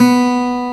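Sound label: acoustic guitar B2; EL-GUT G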